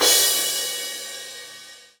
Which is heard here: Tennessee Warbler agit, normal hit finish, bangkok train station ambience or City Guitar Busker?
normal hit finish